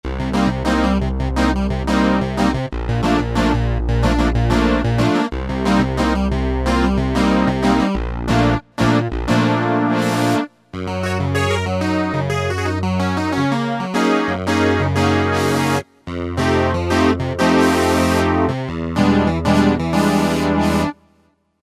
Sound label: P10 Guit Clav, vintage synth; Roland SR-JV80-04